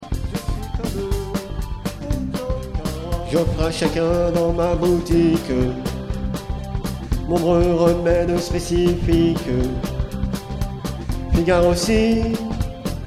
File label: alto